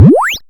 dry snares; Quasimidi Rave-o-lution 309

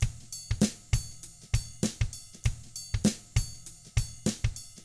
FUNKY Drums; ILIO; Double Platinum Drums 1; 99 FUNKY 2

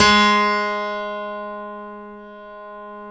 Stereo Grand, Keyboards

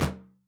SLAP, HAND DRUMS; SoundBytes - Early Patches

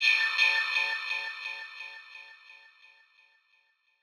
Syn Fx Loop 125; Equipped Music

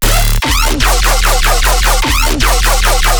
bass loop 150 D; Virtual Riot

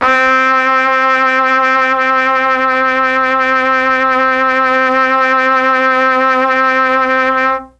C2 trumpet, Mellotron; CHMB TRUMPET; Mike Pinder - Mellotron Archive CD

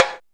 SP1200; DRUMMACHINES

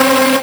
Synths Root C

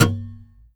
SCR, guitars; Spectrasonics; Hans Zimmer Guitars VOL-2; FNGSTYLE PFM

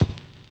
RAGGA; Spectrasonics; Metamorphosis; RAGGAVYNL

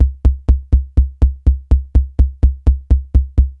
kick, raw

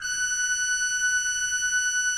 HGRP F5, Cathedral Organ, HOLZGEDACKT; Propeller Island

